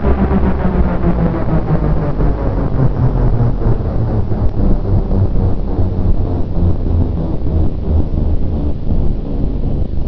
alien builder

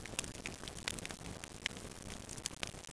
fakel fire

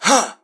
Swing; doctor

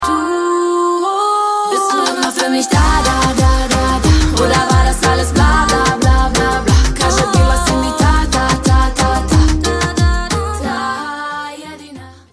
win human

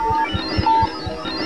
comp beep

